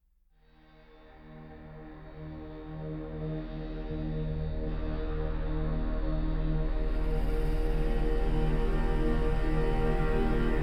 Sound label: Reborn No Piano; Ninja Tracks